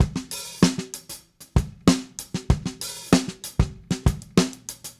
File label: Drums, 70s Analog Funk